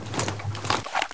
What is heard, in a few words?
m16 get mag, firearms